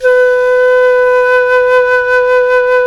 jazz flute

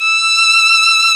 ARCO VIOLIN, Arco Solo Violin; E-MU Producer Series Vol. 2 – More Studio Essentials

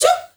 FEM GRP, FEM NOISE; Spectrasonics; Heart of Africa

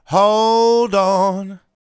Hold On, Vocals, Male

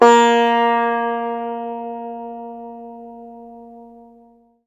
SITAR BB4; Spectrasonics; Heart of Asia

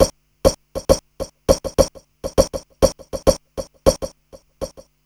FX LP, Hip Hop R n B; USB Soundscan vol.19; AKAI

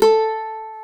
CAVAQUINHO A, Guitars; Spectrasonics; Hans Zimmer Guitars VOL-2

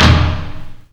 hit, PERC; Techno Trance Essentials

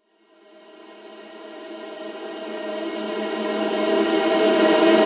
Reverse Vocals D#; Effects; Vengeance; Essential Clubsounds Vol.4

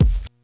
RY30 KIK; Hollywood Edge; The Big Bad Beatmachine

